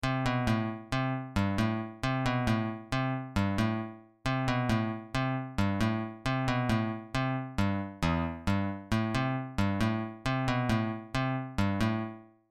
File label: bass on classic guitar